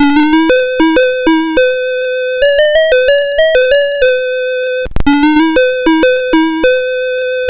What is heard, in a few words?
BASIC, MOS6581R2; C Prog Ref Guide example; Commodore